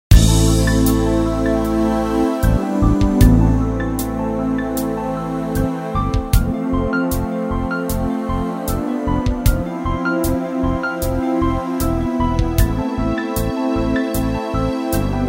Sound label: DREAMY